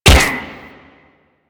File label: UMP Shot; Warframe; Weapons; Corpus; Prisma